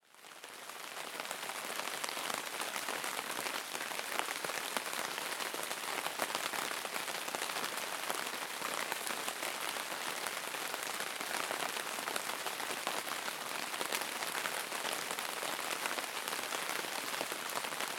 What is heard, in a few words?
rain on tent; Silende regn på teltdug, 30 minutter